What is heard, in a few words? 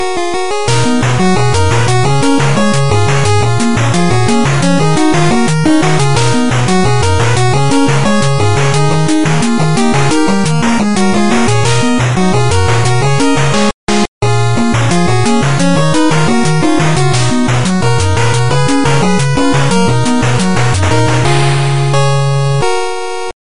8bit; Madoka Magica